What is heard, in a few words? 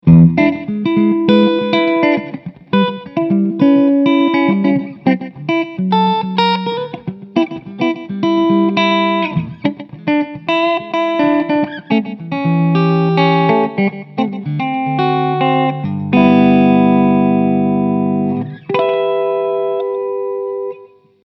düsenberg Stellung 5 less Bass beide PU´s